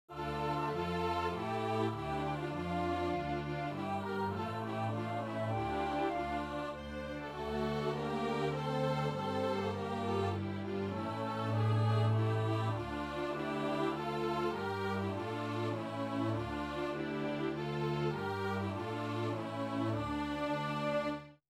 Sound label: aleluia gloria patri